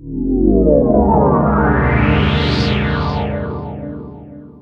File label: Korg, FX; DW8; Back In Time Records; Korg Universe VOL-1; FX FAMILY